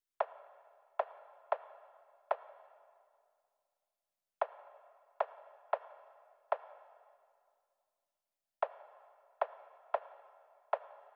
Drum Loop, 114 BPM Percussion; Loop Stems; Cymatics; Vibes II Drum Loop Collection; Prize

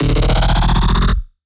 Bass X Spaceship; Dubstep; Bass One Shots; Ghosthack; Dubstep Sound Arsenal; 50 Bass One Shots

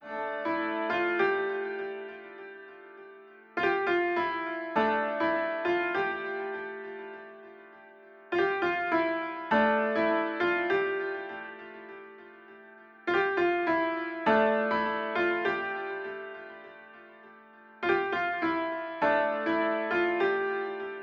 piano, 101 BPM D Min; Cymatics; Blue Faces

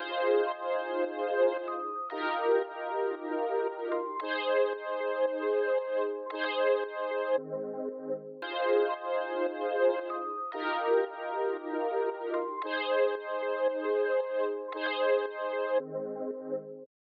114 BPM F Min Synth; Retro Collection

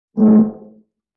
Viola String Finger Rub; Vintage Cartoon; Musical Elements

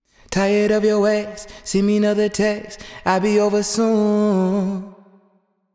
Sung Phrase Wet Tired 90 BPM F# Min; Cymatics; Euphoria Vocal Sample Pack

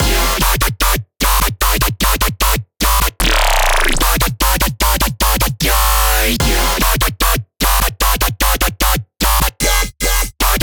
Drop Loop - 150 BPM Fmin, Dubstep, Bass Loops; Synths; Raptor; Cymatics; Dubstep Starter Pack

